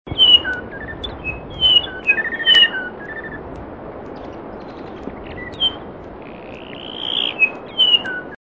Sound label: yellowhead; Mohua